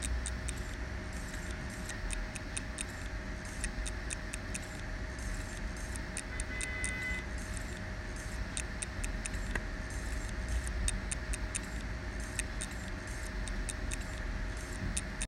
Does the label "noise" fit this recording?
Yes